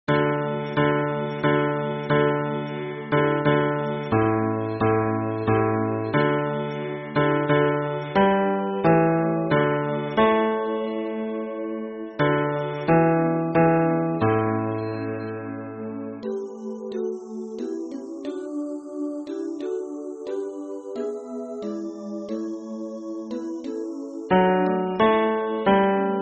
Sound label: hymn bass